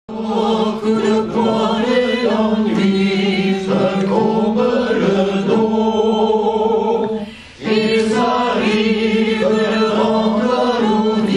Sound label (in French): tenor basse